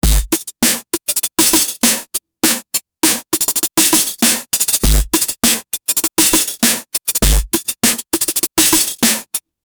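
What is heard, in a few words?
beat machine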